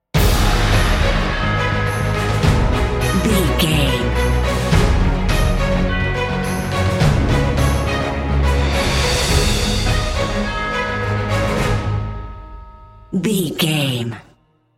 epic cinematic; Full and strong music track with orchestra and percussions. The uplifting and motivational mood will evoke

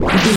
retro spaceship jet boost

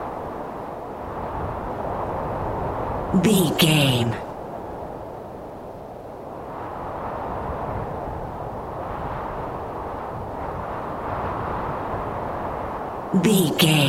wind general soft low loop

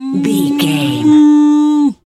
cow moo

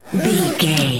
foley zip zipper long

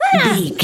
voice fun small character emote angry

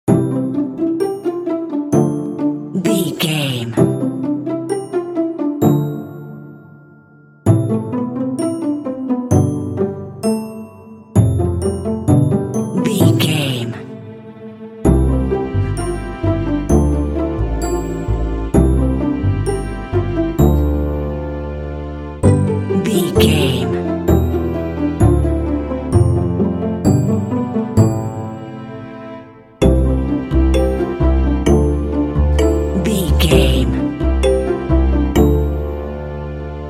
sticky situation no percussion

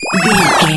retro magic spell cast

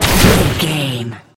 water blast projectile spell

